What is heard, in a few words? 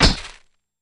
Camera Flash Bulb